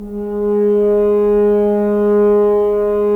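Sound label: Solo French Horn, Brass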